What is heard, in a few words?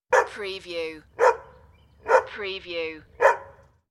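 Dog 3 Barks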